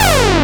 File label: Industrial Analog Single Notes Short